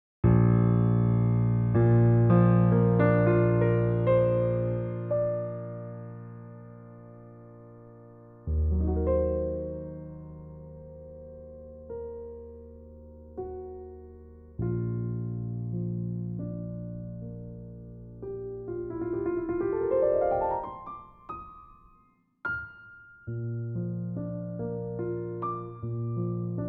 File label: Piano Collection; Katawa Shoujo